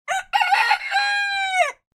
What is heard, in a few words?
rooster crow